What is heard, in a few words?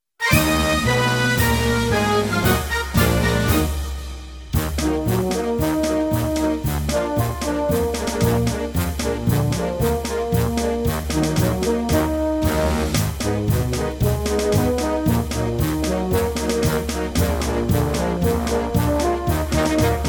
brass minus